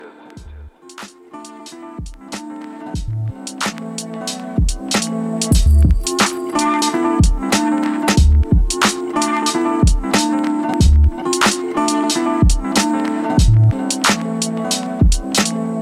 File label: LOOPS